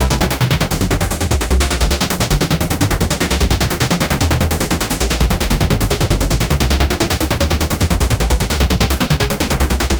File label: gigantophitecus, ape